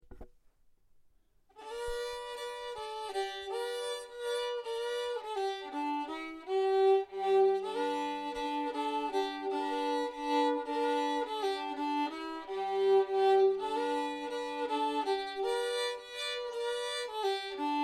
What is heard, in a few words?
Melody